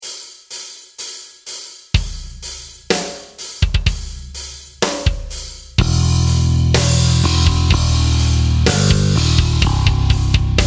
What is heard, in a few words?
Negative Harmony Metal Etude (Backing Track)